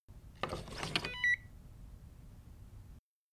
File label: Swipe Key Card Alarm; hi-tech-various